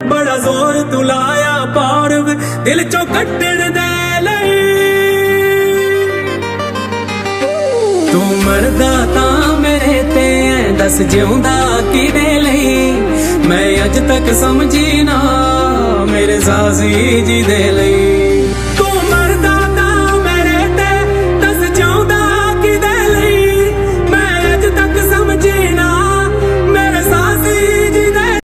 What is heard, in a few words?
Punjabi Beats Ringtone